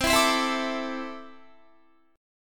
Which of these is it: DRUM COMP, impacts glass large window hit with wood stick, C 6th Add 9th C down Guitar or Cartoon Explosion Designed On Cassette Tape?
C 6th Add 9th C down Guitar